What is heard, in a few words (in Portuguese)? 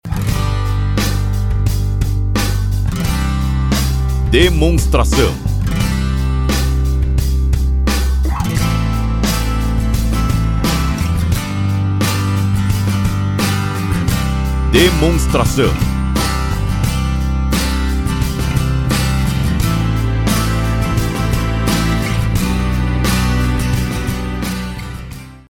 Trilha